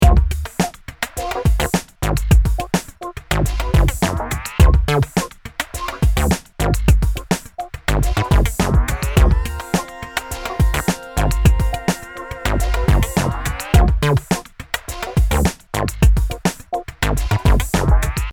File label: Music loop